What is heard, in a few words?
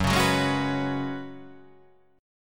F sharp Suspended 2nd Suspended 4th